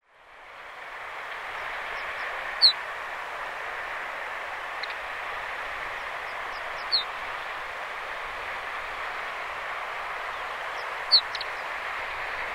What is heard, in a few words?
lapland bunting